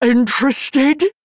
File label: female tuskarr, Attack